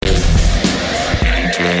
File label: Msg Tone